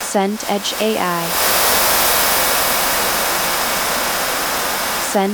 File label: river wide flow